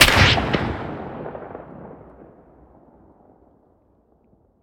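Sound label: weapon sniper sup fire player atmo ext; gmod; viper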